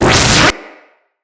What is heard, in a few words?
Shield Deploy Charge; Capture Target